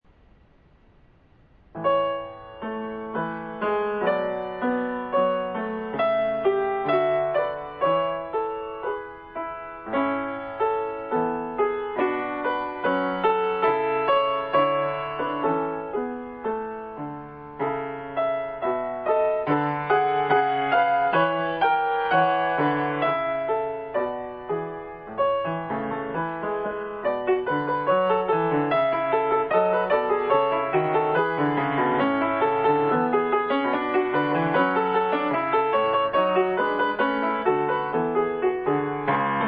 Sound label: recital